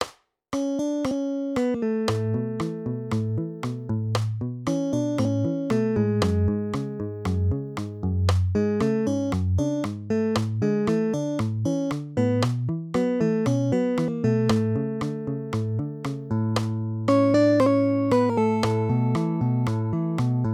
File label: duet, guitar; 4/4